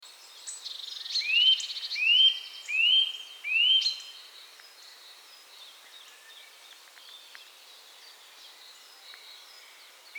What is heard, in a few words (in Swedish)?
Notvacka